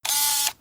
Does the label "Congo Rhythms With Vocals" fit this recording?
No